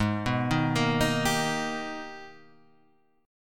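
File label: Ab Minor Major 9th chord, Guitar, Half Step Down tuning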